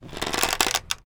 Longbow Pull Back; Timelock VR

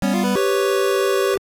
Level Up; Wizardry III Knights of Diamonds; GBC

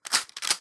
Sci Fi Shotgun Reload

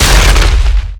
Ice Burst

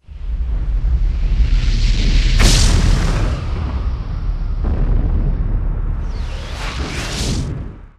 Logo; fate of hellas; Jowood

